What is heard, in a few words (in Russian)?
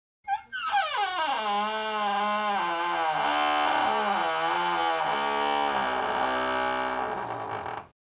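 звук двери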